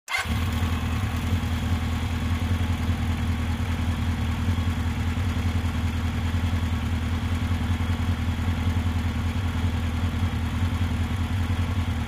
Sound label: Motorcycle starts, waits, drives away